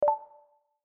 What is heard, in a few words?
notification; open-webui